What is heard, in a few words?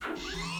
panel open; AssaultCube; Alien Stars